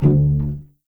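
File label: D1 pizzicato cello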